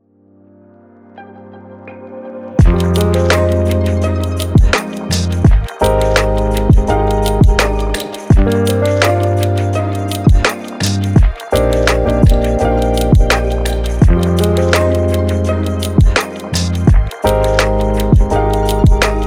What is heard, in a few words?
dreamy chillhop